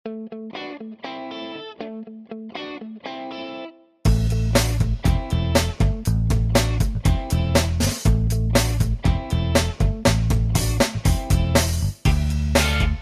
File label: short rolling trumpet